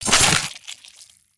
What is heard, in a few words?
Slash Hit